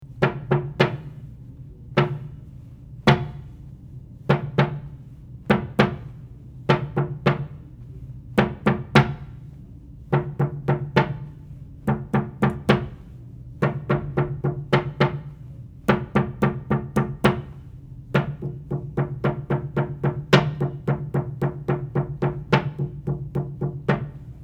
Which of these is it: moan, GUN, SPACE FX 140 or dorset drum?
dorset drum